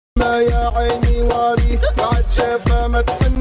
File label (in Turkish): mistik arab muzigi